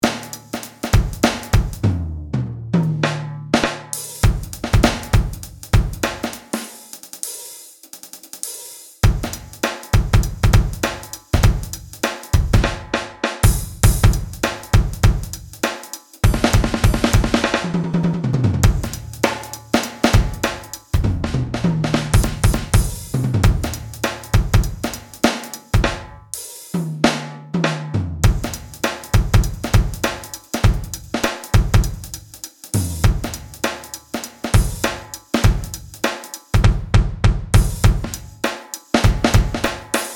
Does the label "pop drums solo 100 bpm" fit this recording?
Yes